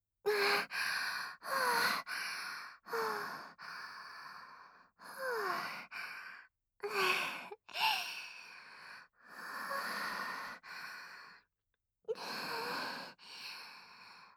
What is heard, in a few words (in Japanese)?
吐息